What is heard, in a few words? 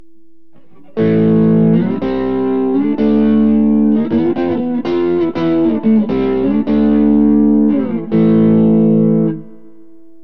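darkburst neck, guitar pickup; burny; fugly clips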